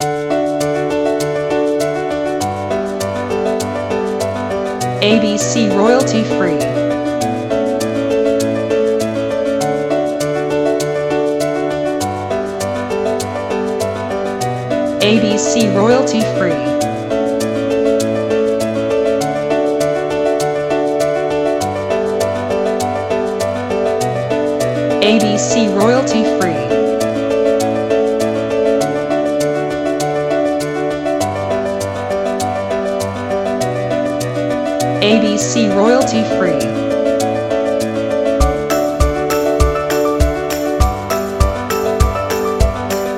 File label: Happy Go Lucky Upbeat Piano